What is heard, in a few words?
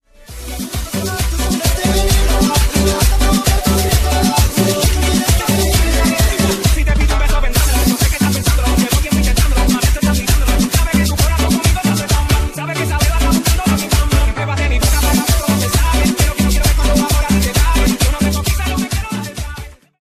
Tribal Dance